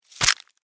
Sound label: Scorpion Tail Sting, Stinger, Attack; Animal, Scorpion; Arachnid, Insect, Bug